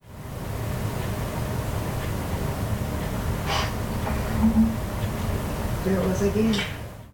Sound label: Unexplained Noise Amplified